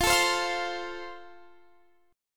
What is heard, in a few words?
F Major, Guitar Standard; strummed